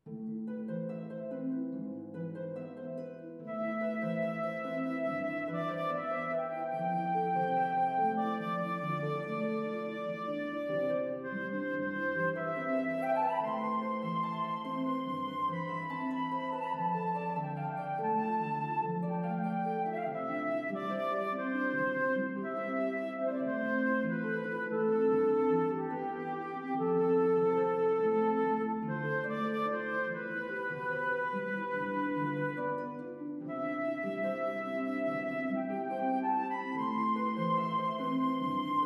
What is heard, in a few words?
lullaby; dream